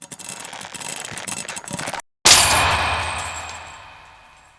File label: breakdown; lance